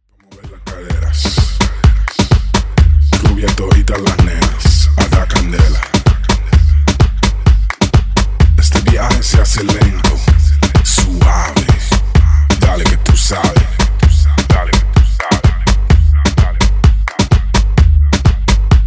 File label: AfroHouse latino 128bpm